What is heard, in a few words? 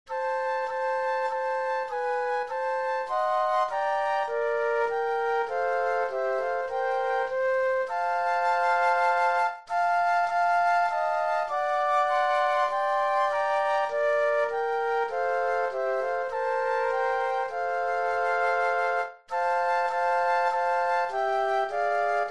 Flute Trio; christmas